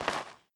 dirt footstep; Adam's Venture Origins

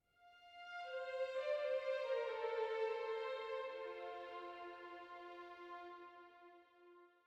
Quite noble festivities

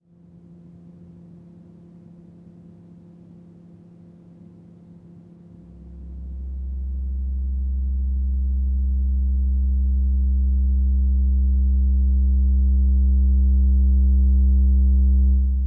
Bass

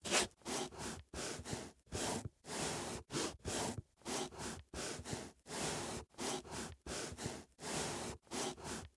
VENT WALKING

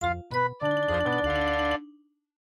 MIDI Animation stings

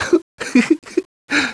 Sound giggle

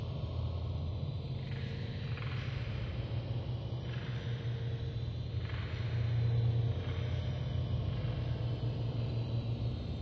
alien ambience; Last Encounter

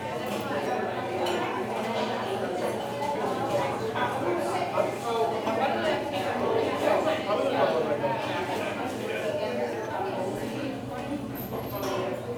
Cake Decorate Crowd; Orleans

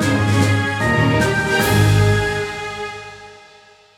war victory; Castle Storm

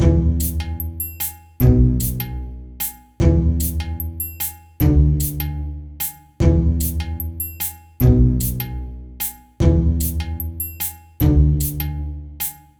Mood Music

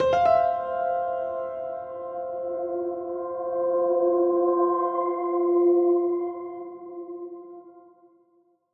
Piano Kora; Koloro

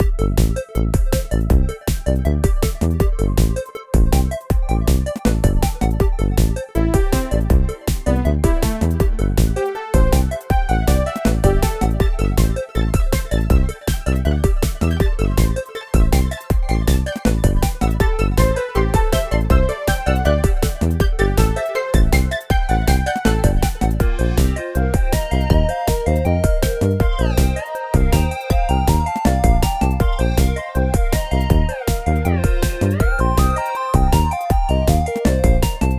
playing; Hoggy2; PS Vita

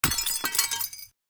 glass break

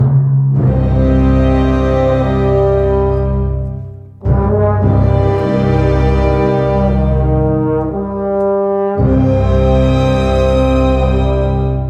Dark Loop; Regalia of Men and Monarchs

